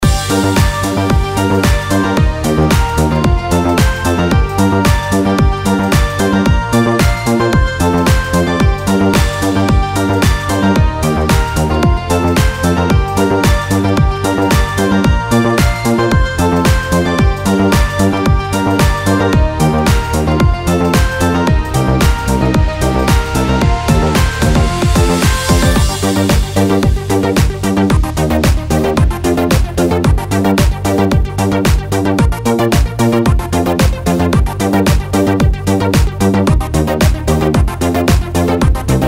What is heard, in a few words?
Slow Motion Disco